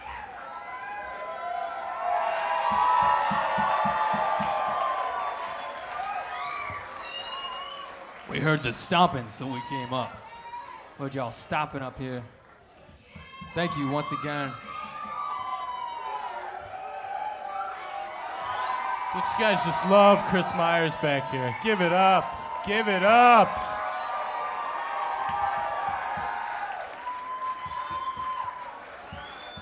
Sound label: more cowbell; Clearwater Theater West Dundee IL USA